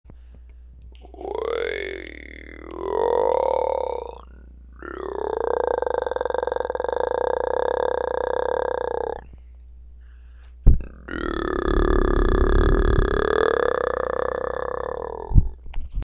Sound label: Glottal Fry